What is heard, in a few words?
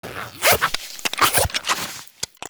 zippers and packing